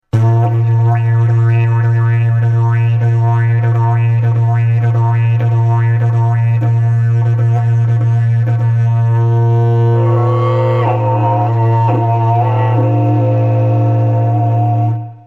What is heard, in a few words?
soprano la la-diese, alphorn